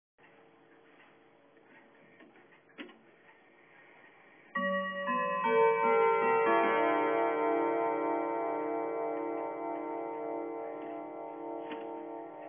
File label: Columbian National Anthem Tune, clock chime tunes